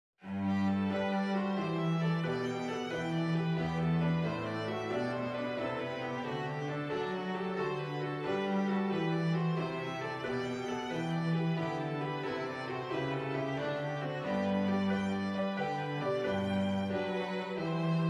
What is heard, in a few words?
Jesu Joy of Man's Desiring - Piano Trio